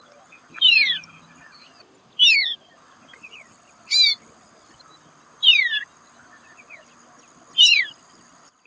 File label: Striated Swallow calls; Tambun Interior Ipoh Perak Malaysia